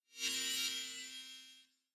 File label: map timeline appear